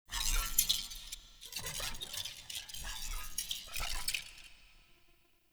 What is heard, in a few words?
Moves Arm; The New War; Lua War Room Part Two; Erra; Cinematics